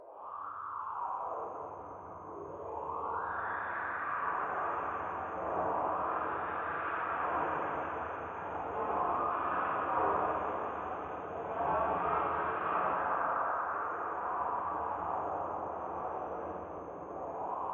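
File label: muffled windy atmosphere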